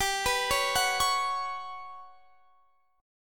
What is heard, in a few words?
G Major 7th Flat 5th G, Guitar Standard; GM7b5 arpeggiated